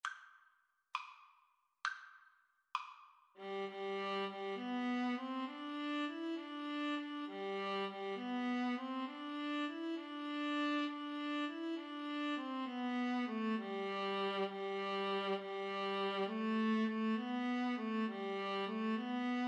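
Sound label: good christian men rejoice Viola Cello; Viola-Cello Duet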